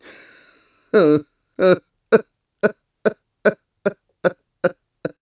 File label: Upset Laugh; Ghirahim's upset sigh and laugh when Link won't concede; Skyward Sword